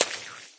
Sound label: splat miss, paintgun; There; ThereClient